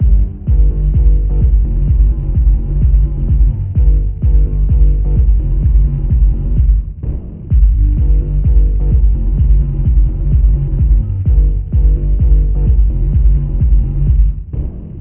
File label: Party Music Doors; Beholder 2